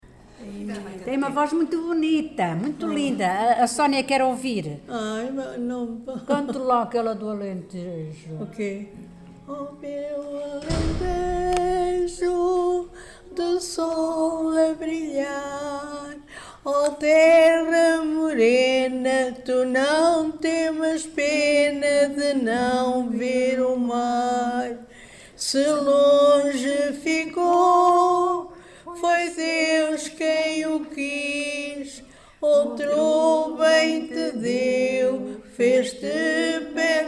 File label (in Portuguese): musica alentejo